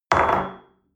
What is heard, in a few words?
espresso place on table medium